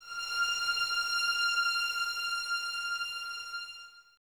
VNS F 6, Violins, String Ensembles; Miroslav Vitous

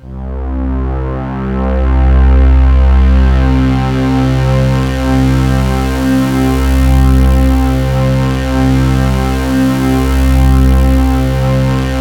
PAD6 C3; USB Soundscan vol.05 - Explosive Jungle; AKAI

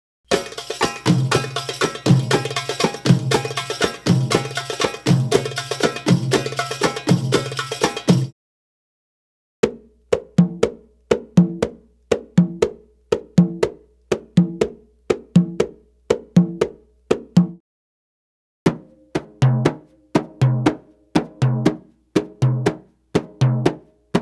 Arabic Rhythms

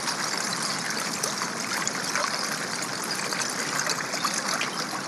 Icy Stream, On The Glacier

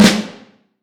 Vintage Snare Drum; 5.5X14; Q Up Arts; Johnny.C's Vintage Snare Drum

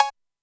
bell, Zoom MRT-3B

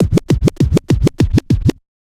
Scratch, Trance

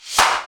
DMA XD-5, DIGITAL

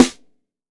YAMAHA SNARE

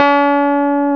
KEY MKS20 E, MKS20 + EP; Roland L-CD701; Pop Pianos 3

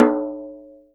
djembe, drum machines

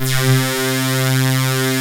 MIX STAB, DANCE; Pro-Rec - Synthsonic; Z1 DANCE

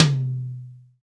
TOM, TOM Dance

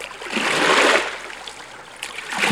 ROW, Boat Rowing

